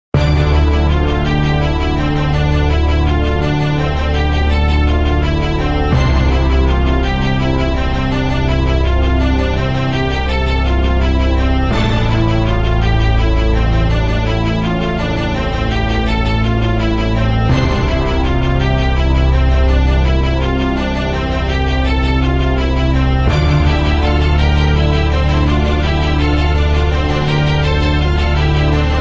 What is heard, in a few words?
Hip Hop Beat